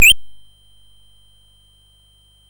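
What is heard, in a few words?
Mountain King, MOS 6581 SID chip music, games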